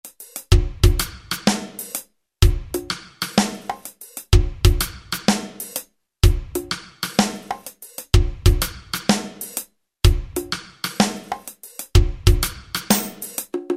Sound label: Reggae, Alesis SR-16; Preset Patterns